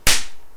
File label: Punch Loud Slap